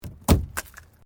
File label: Metal Light Movement Minor; Materials